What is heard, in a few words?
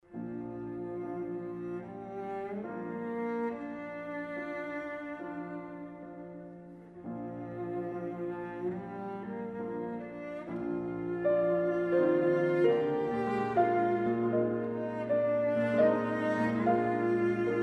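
piano trio; Huss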